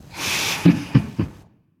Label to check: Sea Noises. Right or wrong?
Wrong